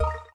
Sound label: quick Stop; jewel fruits